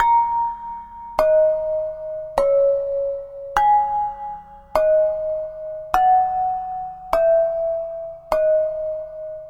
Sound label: Ceramic Bells